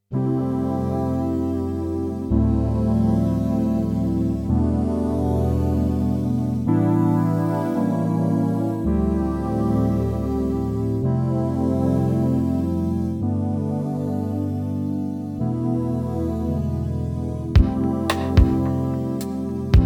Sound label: Ambi Pad DX7II Dual Mode